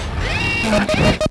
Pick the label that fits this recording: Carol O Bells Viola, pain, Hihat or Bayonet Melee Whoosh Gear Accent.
pain